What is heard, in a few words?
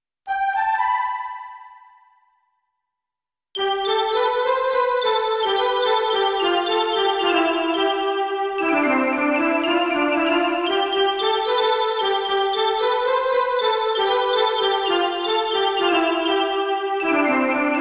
bubble